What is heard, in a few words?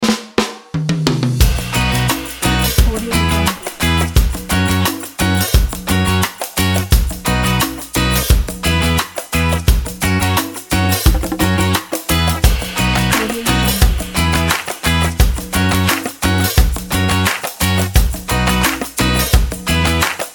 reggae